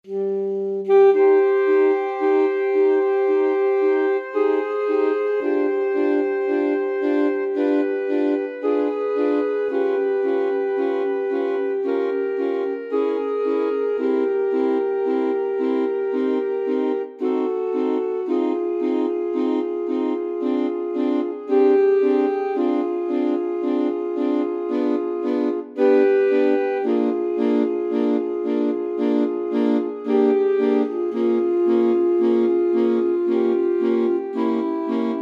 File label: chopin prelude, alto sax